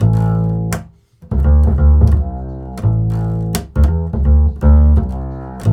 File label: ACID A, Bass, ACIDSWING; Spectrasonics; Bass Legends